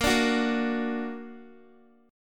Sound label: Bb Augmented Bb Guitar; strummed